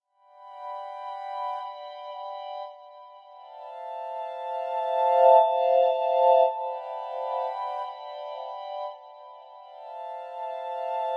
Resonant Bells

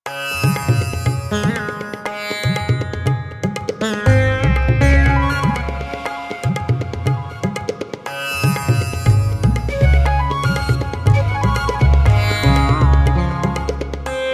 India Tambura Sitar

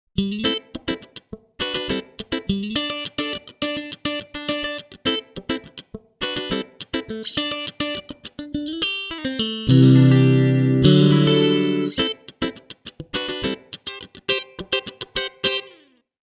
Funk Guitar

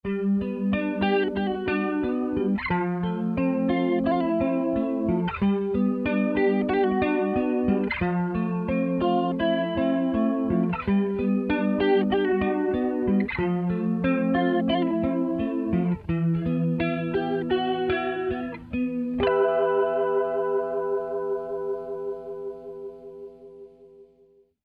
light flange

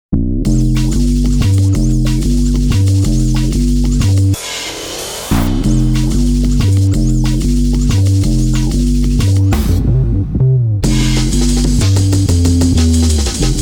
OC-2 Tone Bass

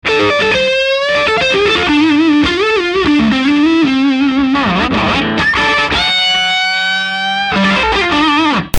Super Lead; Dr Swamp

